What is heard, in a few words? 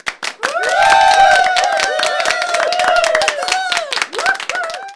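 HAND CLAP + CHEER, SMALL CHEER; 1000fx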